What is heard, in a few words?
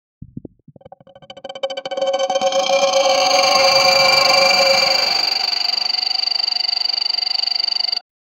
spank sound effect